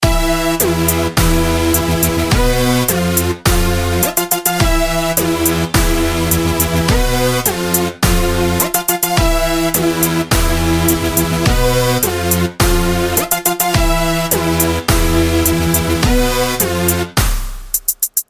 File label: Futuristic